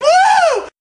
woo

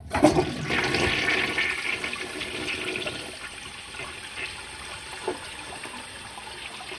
toilet flush

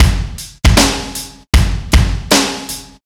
Drum loops Boombap

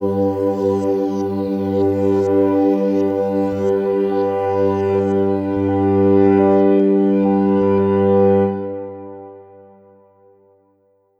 jumpy, synths; Notes

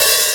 Real Open, Hats

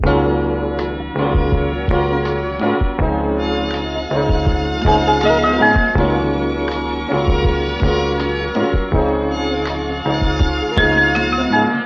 keys and strings; Loops

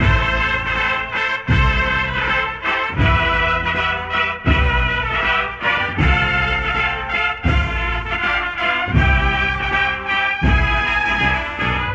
horns, jazz loops

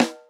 zambia, snares real

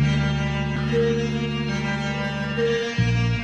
guitar, Loops, Sad

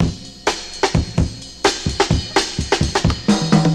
latin, Funky Drum loops

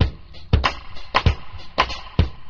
softer now, Boombap Drum loops

